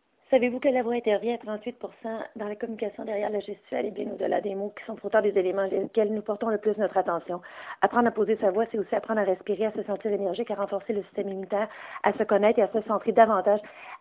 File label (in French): Mauvaise respiration